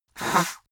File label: nose blow dry short; soundpool